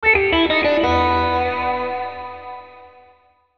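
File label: Musical Element, Drum Snare March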